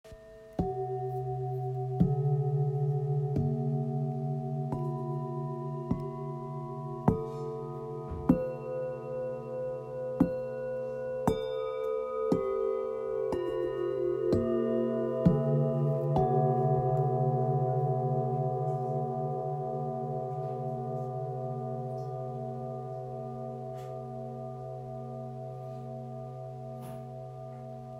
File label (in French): Set bengale; harmonie full moon